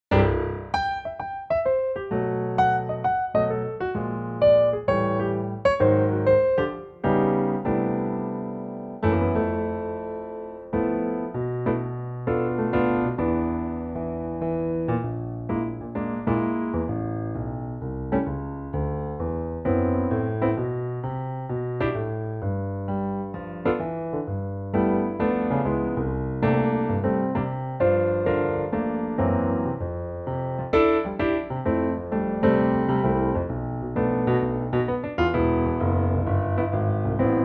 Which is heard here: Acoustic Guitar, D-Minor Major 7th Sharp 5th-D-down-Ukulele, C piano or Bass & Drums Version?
C piano